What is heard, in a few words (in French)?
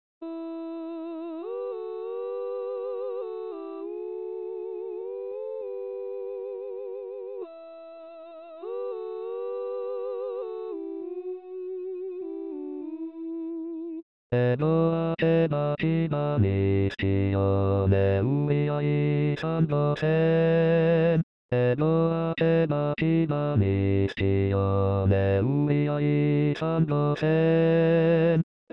Basse